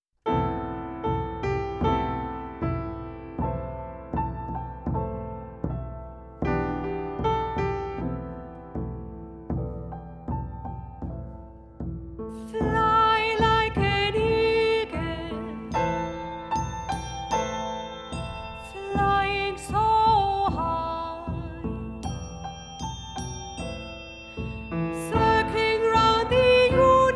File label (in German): komplett mit Gesang